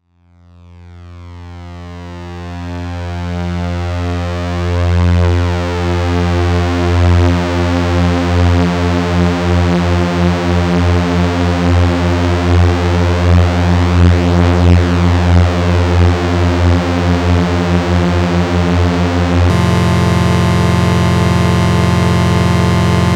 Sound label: Spread Chords